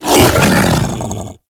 Kubrow Feral Vocal Grineer Finisher Tackle; Warframe

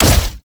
Proj Fire Shot, weapons; Lizzie; Warframe

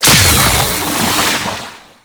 Strike Cast; Warframe; Captain Vor; Abilities Redux

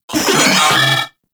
Moa Vocal Enemy Spotted; Warframe

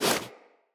Wall Jump Foley; Footsteps; Wall Run; Characters; Tenno

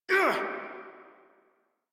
Pain Modular Corpus Male; Dialog; Barks; Battle; Warframe

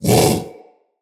Vocal Bark; Characters; Hunting Critters; Predasite

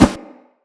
Claw Whoosh, Claw Attack; Characters; Infested; Runner

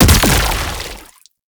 Shotgun Explosion; Warframe; Tenno; Alchemist

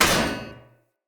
Short Sword Medium Impact Heavy Armour; Weapon Impacts; Player; Swords; Combat

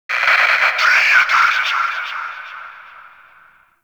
Exterior Notification; Warframe; Grineer; Fortress; Ambience

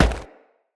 Crewman Footstep Run; Characters; Corpus; Crewmen; Footsteps

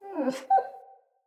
Wolf Cub Trapped Call Close

